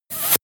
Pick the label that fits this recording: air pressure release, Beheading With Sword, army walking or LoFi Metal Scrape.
air pressure release